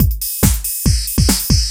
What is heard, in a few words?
Beat 140; Audacity; loops